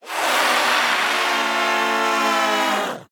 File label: Ghost Jump Scare Vocal; Devour; The Town; devour 2021 gamerip pc macos